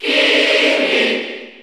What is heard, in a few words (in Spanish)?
Grito del público, Español; Kirby; NTSC; SSBU